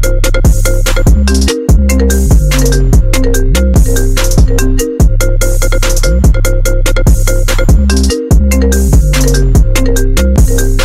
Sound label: iPhone XS Remix